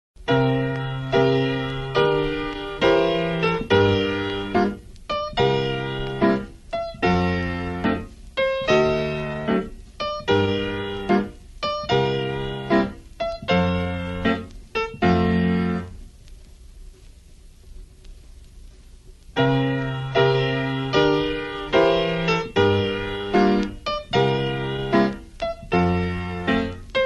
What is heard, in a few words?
shuffles